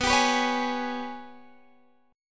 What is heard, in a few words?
G sharp Minor Major 7th Double Flat 5th